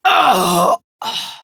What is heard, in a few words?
Death Prime Grineer Female; Tau; Battle Barks